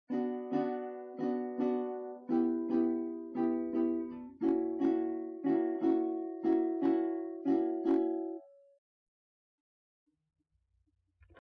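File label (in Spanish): Triada Bm Em F#7 practica principiante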